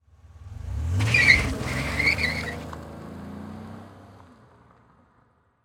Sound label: Transportation Tire Peel Out